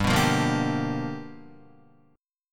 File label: G 7th Sharp 9th G, Guitar; G7#9 strummed; JGuitar